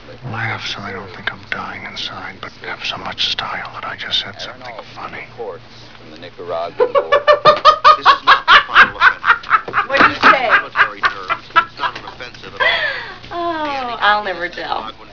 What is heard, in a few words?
laugh